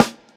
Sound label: natural snare sound A key